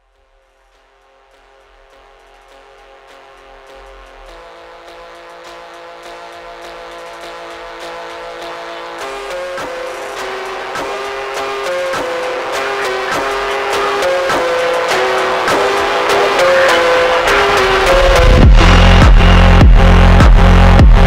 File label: gym phonk slowed